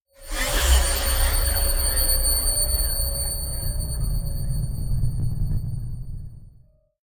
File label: Drone Feedback Monster Scary Logo; Hollywood Edge; sound designer's toolkit v2